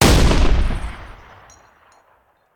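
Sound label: Artillery Cannon Anti Aircraft; Sound Ideas; Guns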